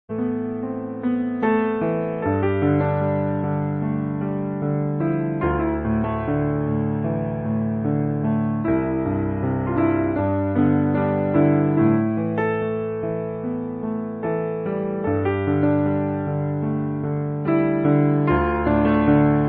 Plain Piano Play; Confuzion

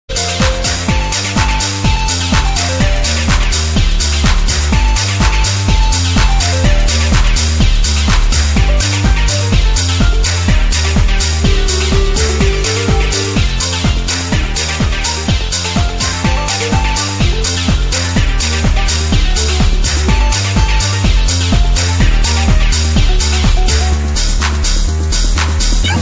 Live Version; Arkanoid Victory

